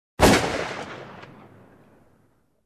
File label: gun